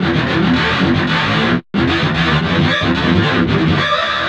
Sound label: GTR LOOPS